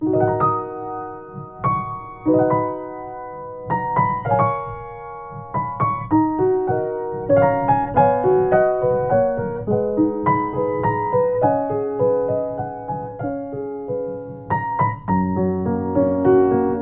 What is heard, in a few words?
Yamaha U1H, piano